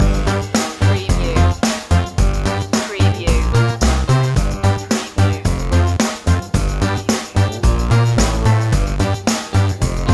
silly and funny loop

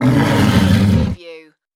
creature vocal

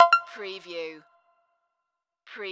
Multimedia Alert

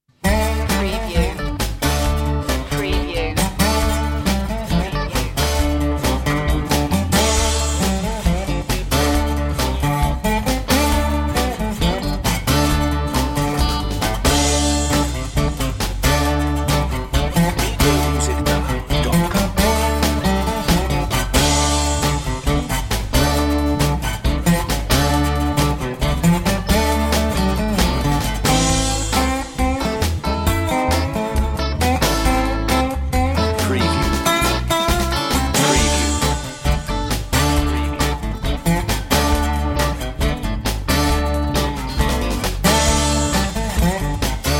reservation blues underscore

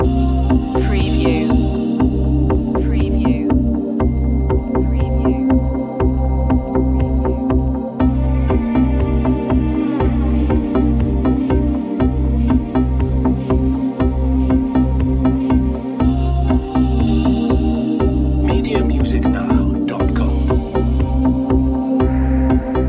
downtempo sunset loop long

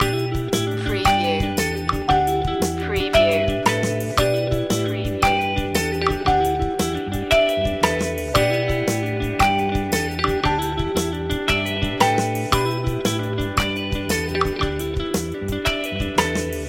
folk loop

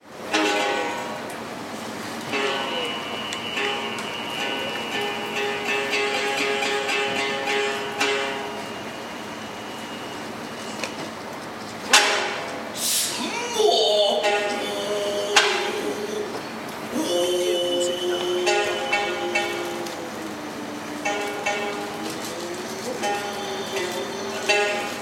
Kabuki theater male sings plays Biwa

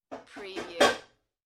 Stacking Dishes; beanstalk audio

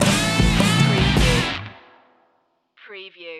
southern fuzz stinger

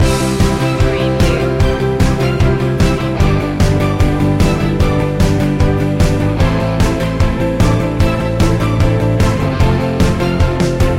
active loop

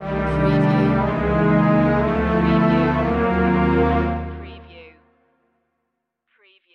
Music Orchestra

